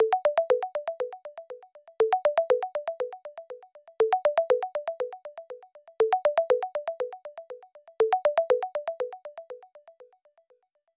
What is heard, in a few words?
ringtone; voyce